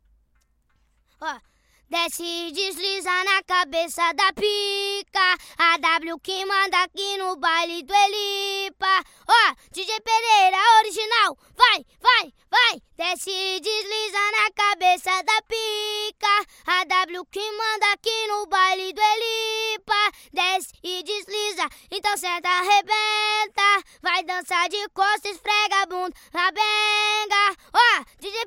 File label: acapella